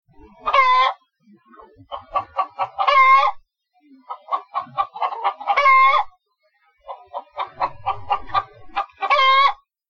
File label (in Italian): gallina; test riconoscimento versi animali